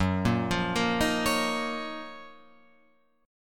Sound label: Gb Major 13th Guitar Open F; GbM13 arpeggiated; JGuitar